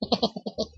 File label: jeje; bouncy golf